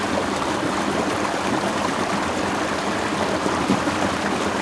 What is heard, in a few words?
RIVER